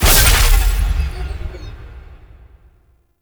Conservation Spotted; Warframe; Duviri

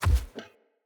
Corrupted Battle Dog Walk Default Step, Footsteps; Animals; Foley

